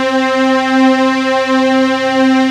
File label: STRING, Techno Trance; E-MU Formula 4000 Series Vol. 2